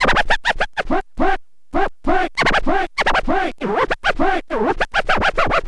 scratch med, Vinyl Attack scratches mix

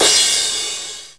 909 HITS; AMG Megabass REMIX! VOL-2; SINGLE HITS

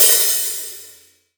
REAL DRUMS POP KIT

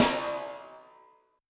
Snare Rubber, Snare; Yamaha DTXpress-II

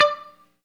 GTR Mute; Club-50 - Foundations; Funk & Mute; Chunk Mute

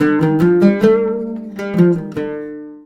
LUTH H, Arabian Traditions, LUTH RIFF; USB Soundscan vol.43 - ArabianTraditions; AKAI

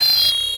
MET SW SIN, SWORDSING; Total Control - Action Cutz

